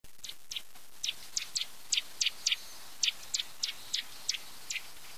kowalik; wroblowe

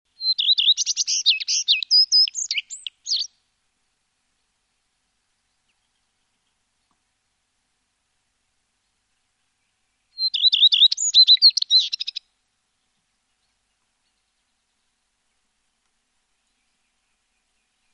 pleszka - jeszcze inna kompozycja; wroblowe; turdidae

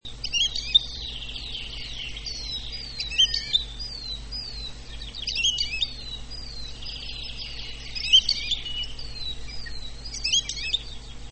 lerka - śpiew na ziemi; wroblowe; skowronek

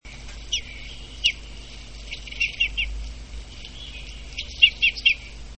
krzyżodziób modrzewiowy - głos kontaktowy

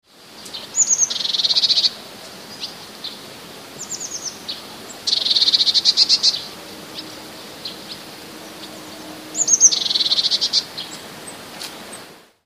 zaniepokojona, ptaki modraszka; który jest serią szybkich, nieco skrzeczących dźwięków. Seria ta może być poprzedzona kilkoma wysokimi gwizdami

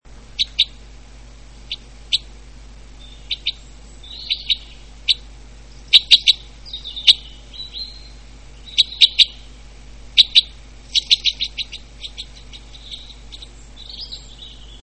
krzyżodziób modrzewiowy - głos w locie

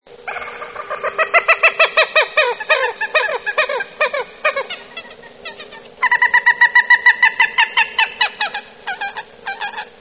kokoszki, kurki wodne

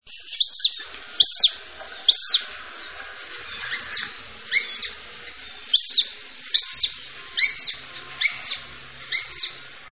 błotniak zbożowy

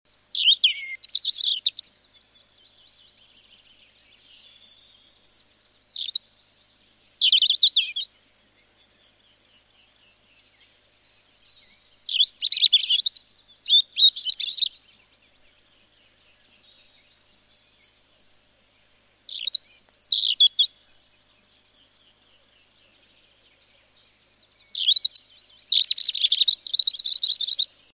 rudzik - inny przykład śpiewu; wroblowe; turdidae

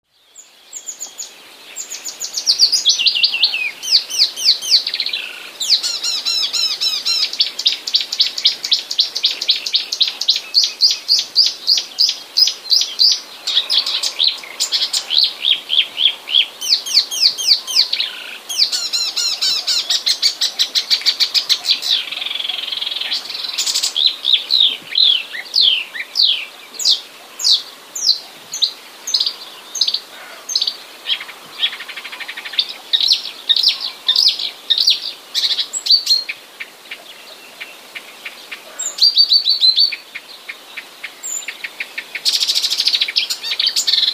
lozowka, nagranie tego sympatycznego ptaszka; wroblowe; zapozyczenia

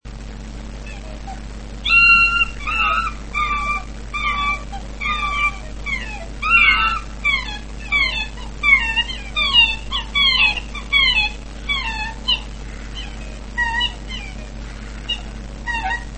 łabędź czarny

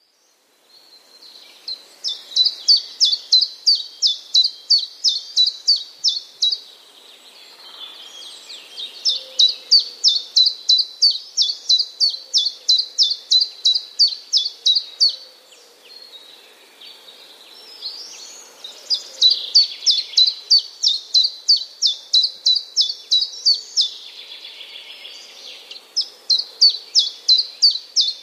pierwiosnek, nagranie w normalnym tempie pierwiosnka śpiewającego blisko mikrofonu

